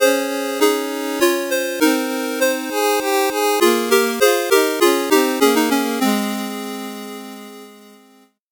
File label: 8bit jingle normal